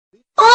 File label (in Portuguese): gemidinho